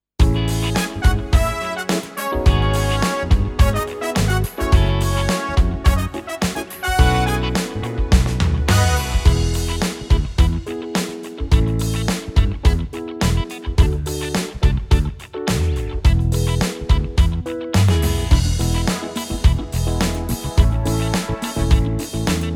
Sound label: Korg Pa5X Style Street Soul